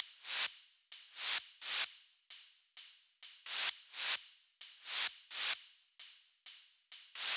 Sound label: 130BPM Organity Kit; Vengeance Samples